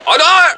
pain

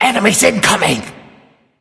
Laughing Skull; Incoming; Bakasura

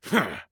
Grunt Attack; Astro

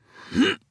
Assassin Grunt Slow; Realm Royale; Anarchist

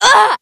Wound; Battlerite; Nomad

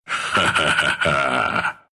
laugh, ru; Dota 2

